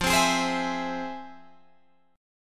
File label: F Minor Major 7th, F, Guitar, Open G